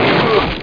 crushed; PC Gamer IT CD 31

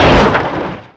HEAVY, COLLIDE; ImmersionRacing

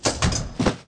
newspaper; sverigejakten

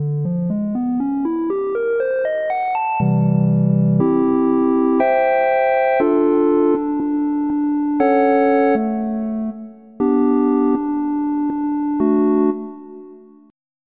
Sine Worm; Onj's Soundfont

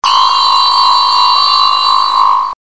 beam; szachy; afchess

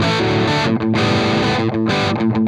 Southern Rock, Guitars; ACID Music

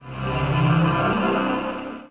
boost pickup; Daikatana; items

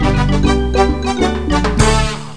Calypso; Frog Xing 1.1

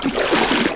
step; KINGPIN; player; water

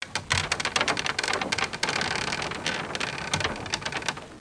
Wooden Door Creak; How Many Bugs in a Box

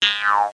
Twang

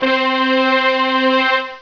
vio 8va C3; Alone In The Dark Demo

